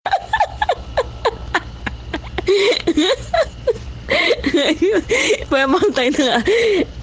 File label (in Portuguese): risada